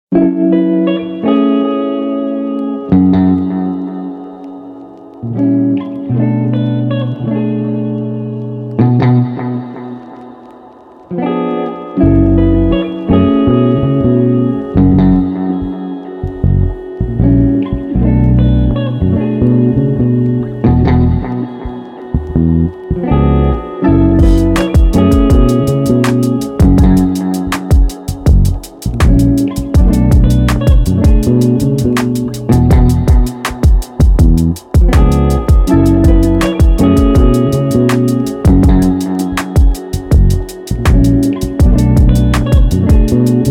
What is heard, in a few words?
GTRnB 86